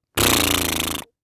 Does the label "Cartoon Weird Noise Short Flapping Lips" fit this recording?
Yes